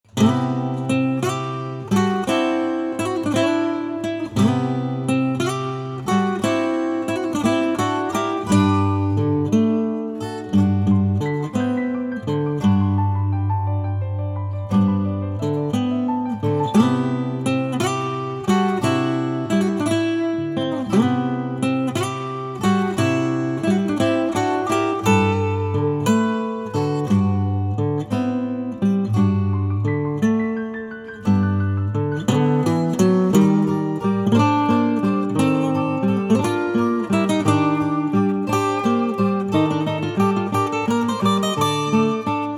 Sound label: Acoustic